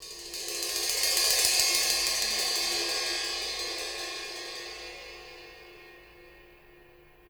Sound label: CYMB; Houseworx; Drums; Cymbal